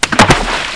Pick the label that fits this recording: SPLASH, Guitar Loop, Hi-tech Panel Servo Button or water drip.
SPLASH